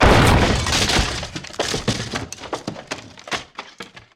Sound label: Crate Explode; Solo